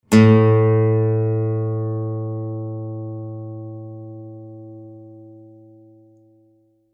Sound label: String La A